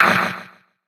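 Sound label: Punter damage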